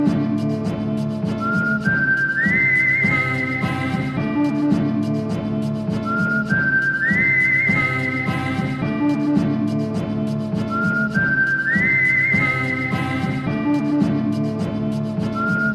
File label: cowboy whistle music